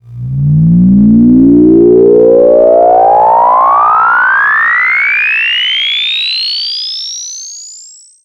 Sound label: fx mod rise; One Shot FX; Sample Magic; Transmission-X